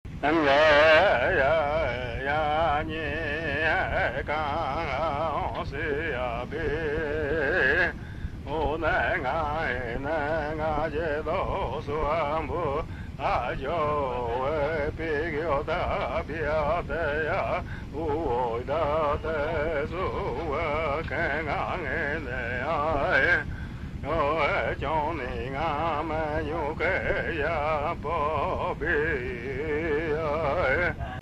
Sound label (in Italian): canto, Ayoreo